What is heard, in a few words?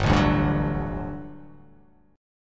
G Major 7th Suspended 2nd G down